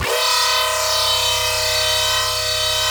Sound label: Noise Osc; Synthetic's Serum Collection; Cymatics; Shapeshift Noise Osc Samples for Serum; Country Club